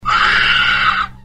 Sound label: scream